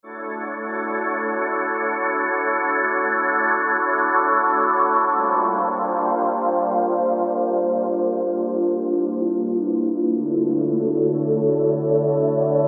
synth textures, Swirl; Serena Swirl; Apple GarageBand 2

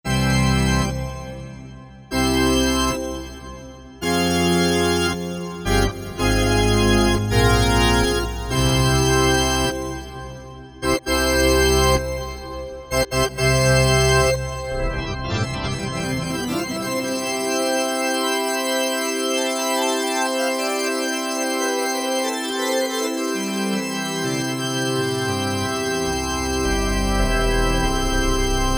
Pipe Organ, Korg MSC-9S Organ

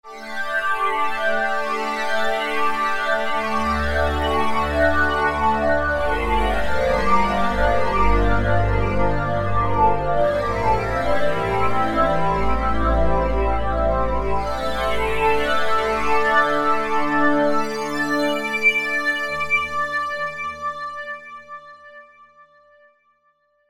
SYNTH PADS; Mercury Wash; Apple GarageBand 2

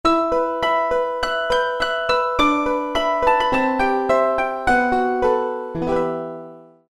High Piano; Roland PN-D50-02

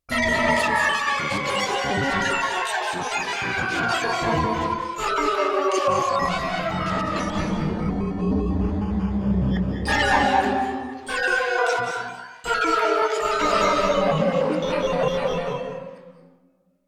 Musique Concrete, Roland VC-1

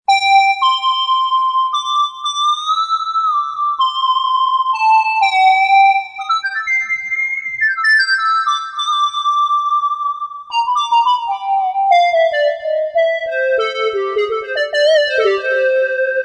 OZ Lead, Yamaha TG55; Factory Presets

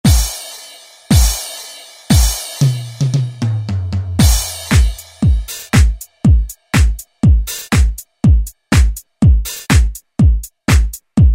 Deep House Set, House Collection; Roland SR-JV80-19 House Collection

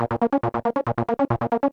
Arpy; Magix Sound Pool 5 Collection

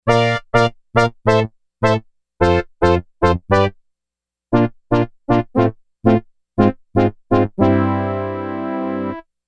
Sy.Brass, Yamaha DX11; Factory Preset Voices

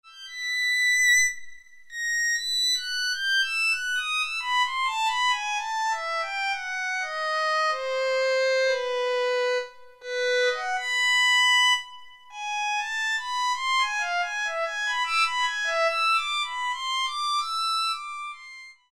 SoloViolin, Roland MKS-50; Factory examples

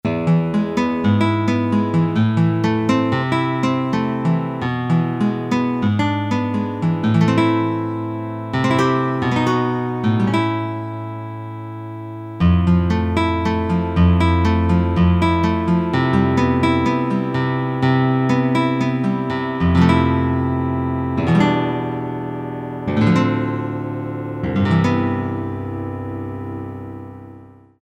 guitar, Acoust

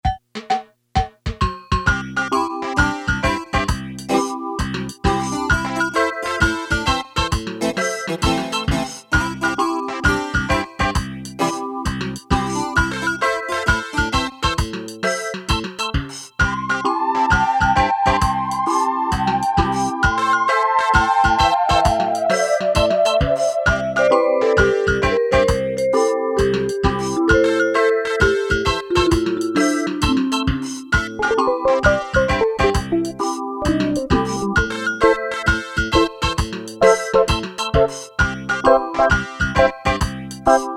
Reggae, Casio CT-370, Rhythms

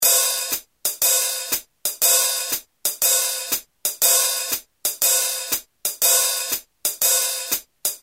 SWING, Roland R-8

